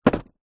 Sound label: sport skateboard down gently